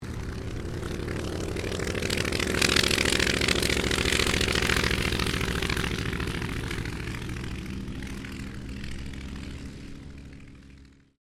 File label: AIRCRAFT RYAN PT22 TAKEOFF; 1942; Airplanes; Vehicles